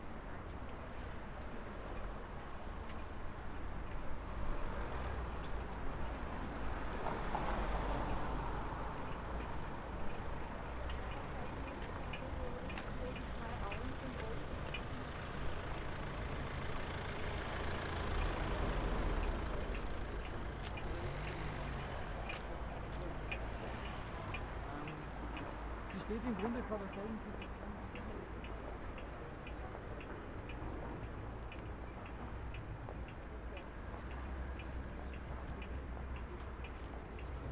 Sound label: Vienna Walk with Ticktock; Crosswalk Signal; Sirens and Crosswalk Signals